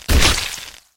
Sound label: Crush Gore